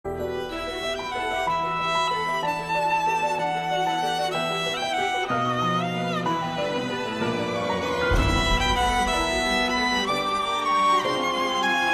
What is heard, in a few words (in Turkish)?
keman zil sesi; cep telefonuna keman sesleri